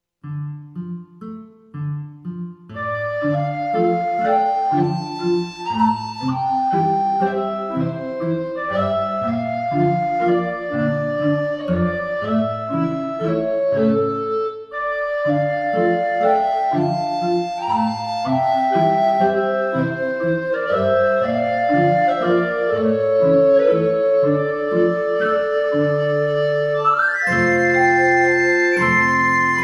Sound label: recorders